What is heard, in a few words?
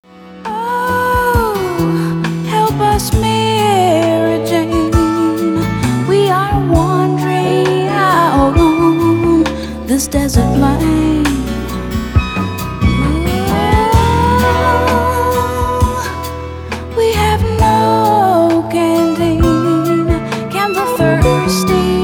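jazz vocal female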